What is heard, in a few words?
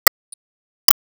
Enemy Tower Falldown; disney star smash android ios mobile gamerip 2020